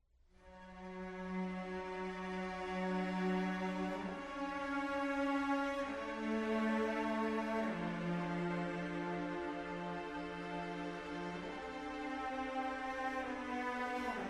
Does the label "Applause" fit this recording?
No